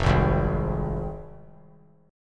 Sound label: F Minor F down; Fm strummed; JGuitar